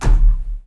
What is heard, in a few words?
Jump